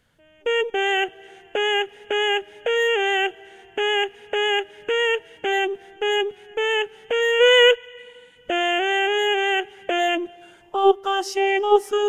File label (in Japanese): お菓子と娘【BassⅠ】